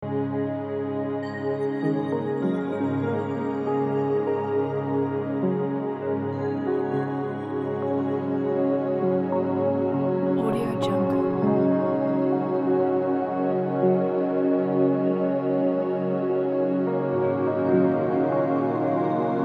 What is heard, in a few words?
ambient soundscape loop